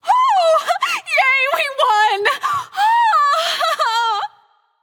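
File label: yay, female; smite; voicepack; hera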